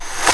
VEE Clap

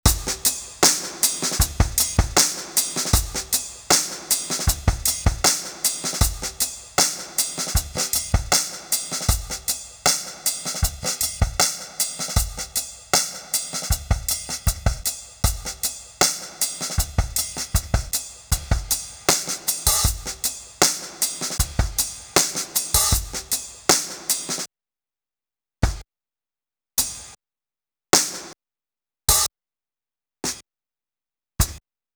Audio Track, Hammond

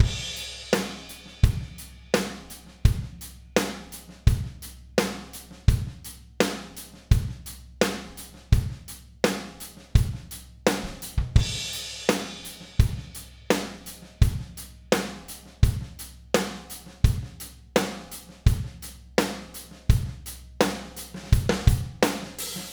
verse, Hammond

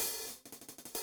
Hat

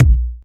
VEE Bassdrum

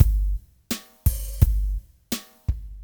Loop, Hammond

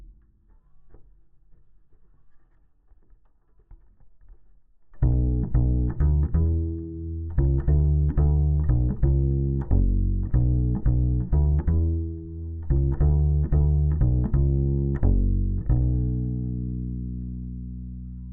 Bass DI

